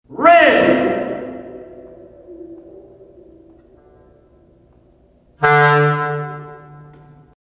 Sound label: Clarinet